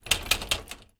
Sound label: household metal security grill gate door shake check locked